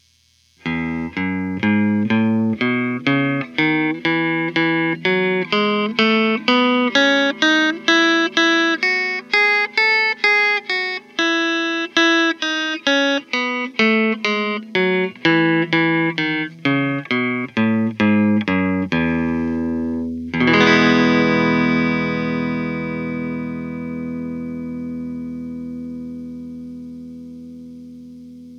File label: E Major Neck Clean, guitars; Otto Power Scale